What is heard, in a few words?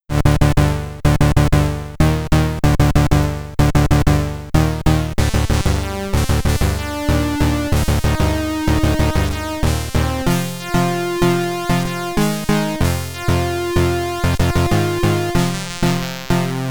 BGM (SID Stereo), commodore 64; demon's kiss